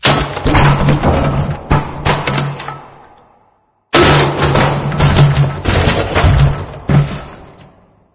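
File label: elevator debris pileup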